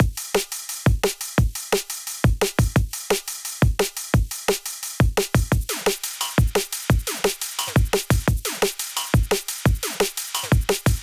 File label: 174 DRUM LOOP